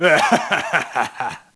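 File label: laugh, thug; SINDEMO; cinematic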